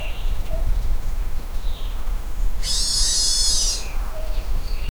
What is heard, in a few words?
an owl flies in and